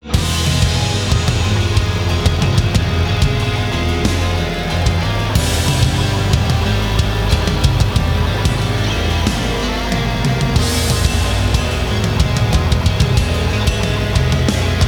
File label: Dark Scary Terror Building Heavy Metal; CUES for film TV games etc